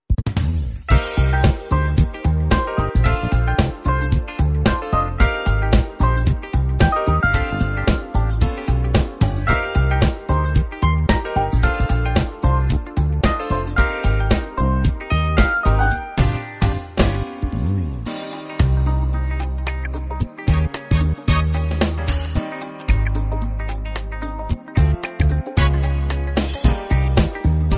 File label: Funky Disco, KORG Styles